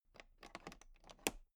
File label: toolbox latch close loose soft